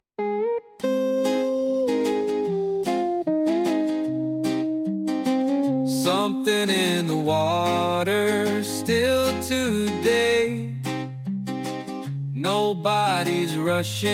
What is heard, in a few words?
birthday, name songs; cecil